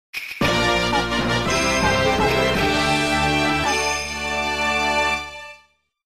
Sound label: Fanfare; Warlord Evolution; Conquest